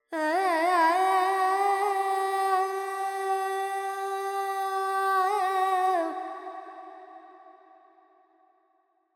Carnatic Female Alaps, Panthuvarali, 100 G, FX

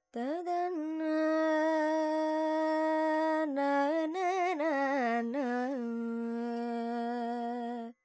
Dorian, Vocals Carnatic Female Alaps 70 G Dry

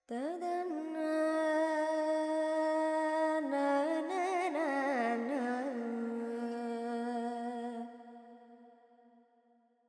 FX Dorian, Vocals, Carnatic Female, Alaps, 70 G

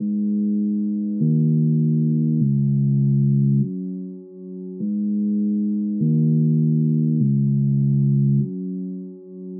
Key Mello G#m 100; Melody; Keyboards

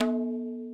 madalam notes L

